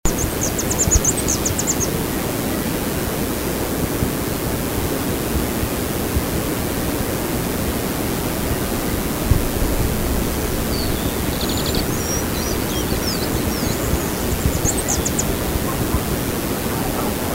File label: sapphire-vented puffleg